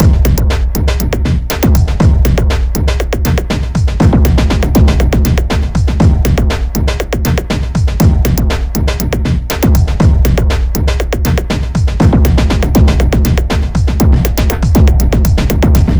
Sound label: Beat, Drums & Percussion; Electrocution; Beats